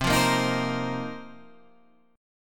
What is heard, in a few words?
A sharp Suspended 2nd Suspended 4th C; strummed